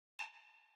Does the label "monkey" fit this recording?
Yes